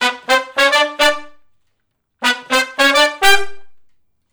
Funk Riff (G) unison, Horncraft for R&B; Sections; Sonic Foundry (Sony Creative Software)

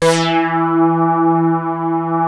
Prophet 5 E4, Synthesizer; Hollywood Edge - Giorgio Moroder Rare Synthesizer Collection

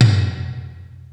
Tom, Yamaha SY22

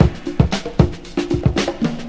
breakcore breakz

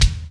kit; Roland - LA Composer vol. 2

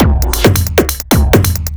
Beat, Drums & Percussion; Electrocution; Beats

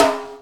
sfx perc

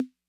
Snare Accent Min Tone Min Snap, 808; classic machines

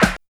SNARE 2; East West - Phat & Phunky CD2; 90's Sample CDs

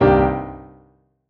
piano stab

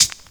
HH, Dance Mega Drums; Best Service; HH-HIP

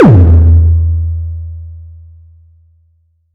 boom, Kicks; ModelCycles

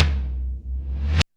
REV TOM MD; Back In Time Records; Big Bang; ST.REV.TOMS

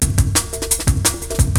BREAKBEATS, TECHNO

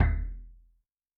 Ratl Boom, Yamaha DTXpress-II Kick

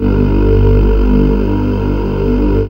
LO VOCAL "OH"

